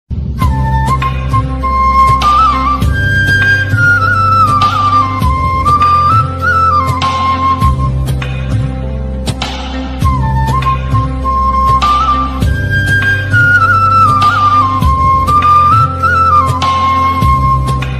krishna traditional bgm attitude ringtone love ringtone